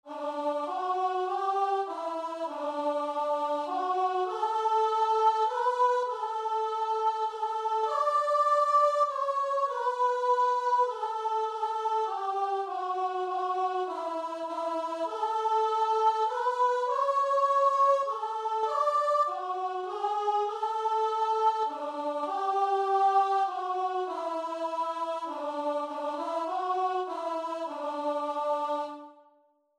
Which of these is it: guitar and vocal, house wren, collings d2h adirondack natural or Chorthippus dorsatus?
guitar and vocal